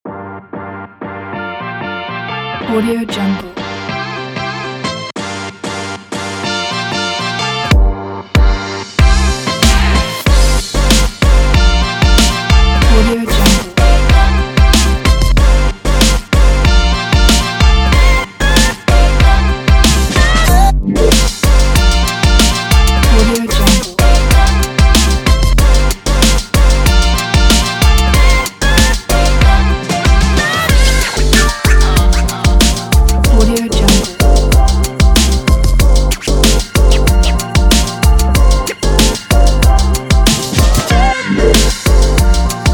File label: hiphop